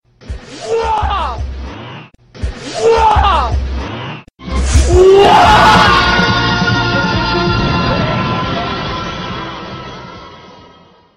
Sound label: Sms Ringtone